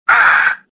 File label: gasp; swamp2; Star Wars Jedi Knight II Jedi Outcast; XBOX